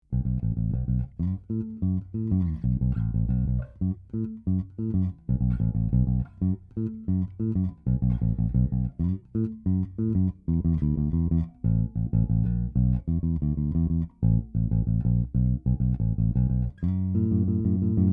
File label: sound, liuteria